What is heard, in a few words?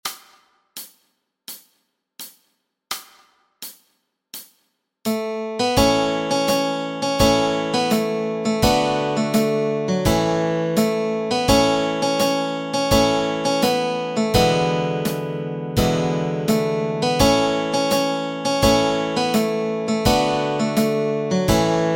When the Roll is Called up Yonder melody and chords